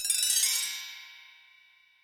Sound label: Bell Tree, Yamaha DTXpress; drum machine; Percussion